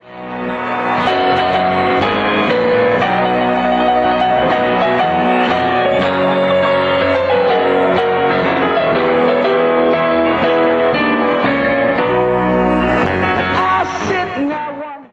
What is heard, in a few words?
Piano Solo